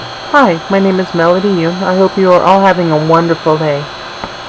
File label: melody